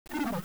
alert

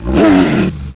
alert

